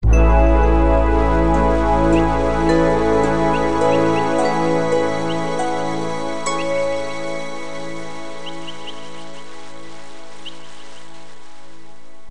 Dreamy Morning

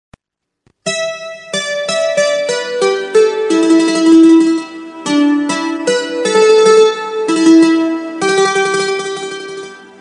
Song Electronica

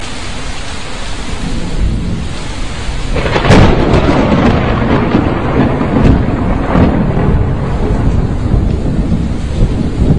Rain Thunder Storm

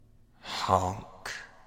Honk

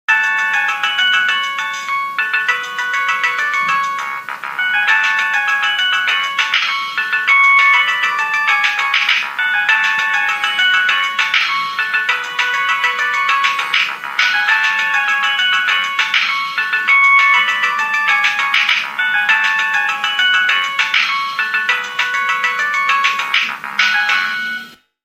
NOKIA 6060; Memory

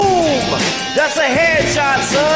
Head Shot

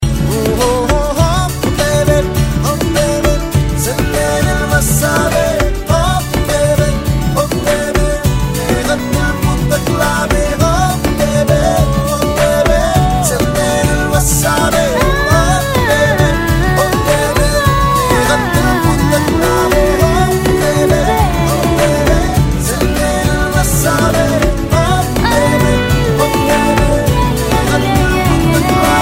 Rocking

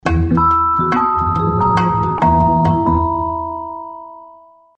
Nice Tune

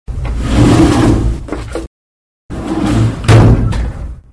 Desk Open N Close

